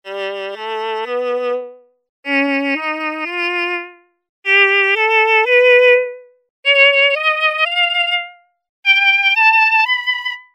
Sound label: violin